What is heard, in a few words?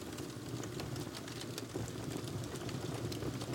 Flames Loop